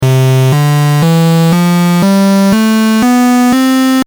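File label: saw square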